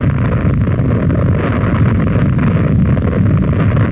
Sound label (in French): avalanche